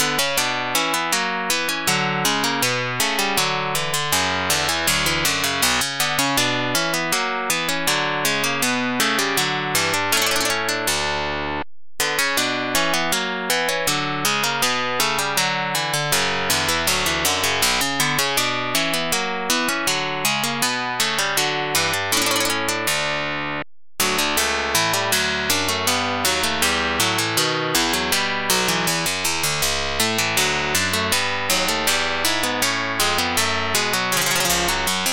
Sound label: bourree